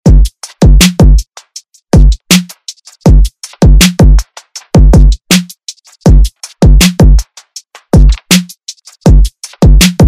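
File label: Top40 Extended Redrum Dirty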